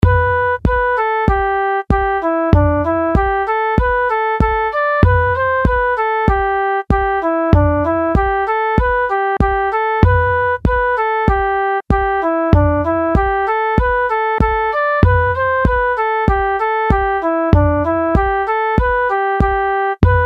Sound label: Reel with slow rhythm